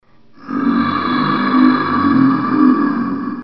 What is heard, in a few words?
zombie; dod